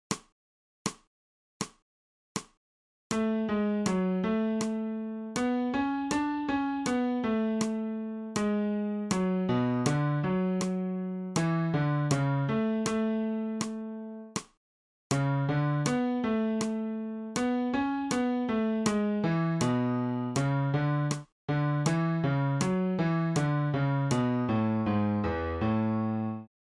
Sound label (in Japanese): 新フレットレス